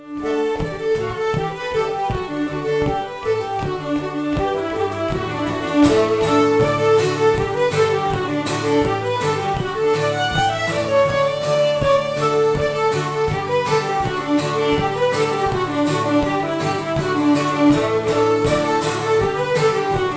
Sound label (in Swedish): Engelska fr Blekinge; efter Ola i Skarup